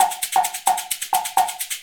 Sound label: Wood Block and Shaker